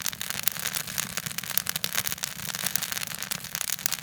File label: torch test; planeshift